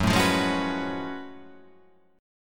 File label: F sharp Minor Major 11th